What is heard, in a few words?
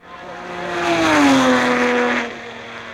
CAR FX